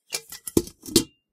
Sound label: set Down, Helmet; Kenney's Sound Pack; Foley Sounds